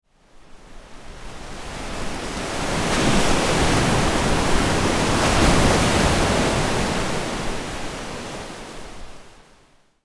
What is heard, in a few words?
Ocean waves